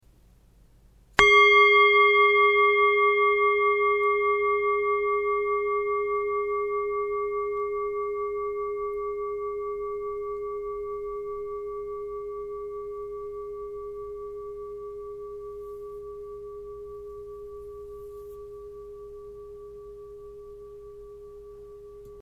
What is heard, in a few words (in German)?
Klangschale